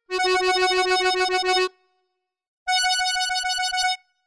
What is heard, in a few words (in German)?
Oktavtrill